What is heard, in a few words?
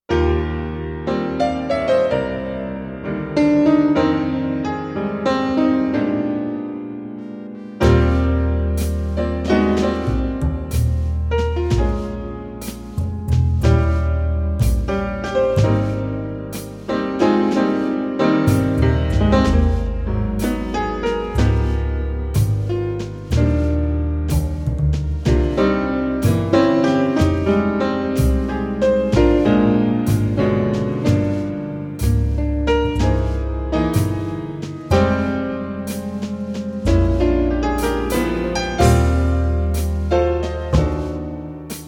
brass minus